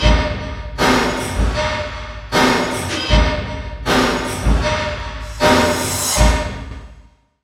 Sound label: XENON END L, Industrial Loops